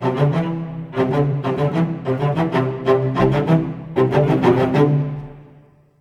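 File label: A minor part 1, Low Strings, 95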